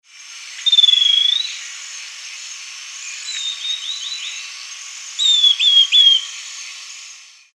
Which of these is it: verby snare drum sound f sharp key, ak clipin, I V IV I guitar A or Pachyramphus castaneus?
Pachyramphus castaneus